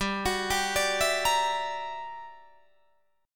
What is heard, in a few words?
G Minor Major 13th, G, Guitar, DADGAD; GmM13; arpeggiated